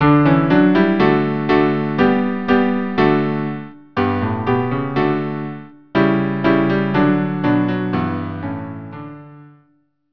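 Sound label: cello piano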